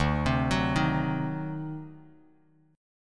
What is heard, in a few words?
D 7th Flat 9th D down Bass Standard; D7b9 arpeggiated; JGuitar